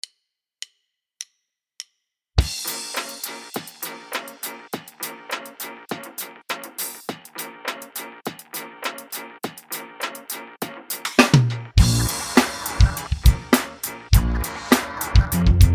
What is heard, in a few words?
drum funk